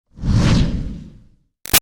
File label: Fireball Whoosh Large; Whooshes; Sound Design; BigRoomSound